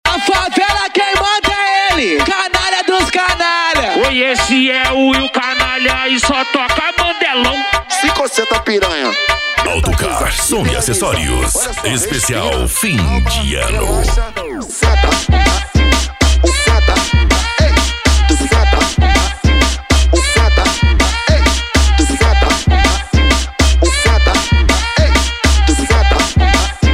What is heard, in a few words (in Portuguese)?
funk agressivo ritmado e funkadao